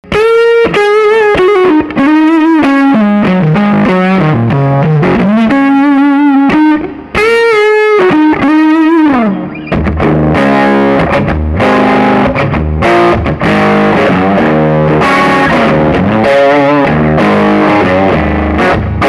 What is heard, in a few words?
mercury tube fuzz; verity; effectrode